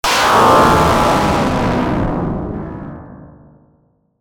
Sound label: Impact Static Blast; bottlerocket